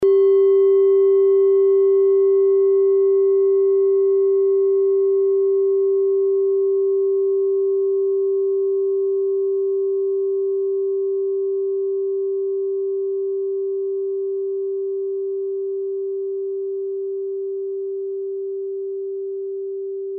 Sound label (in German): kleine klangschale; Diese Klangschale ist eine Handarbeit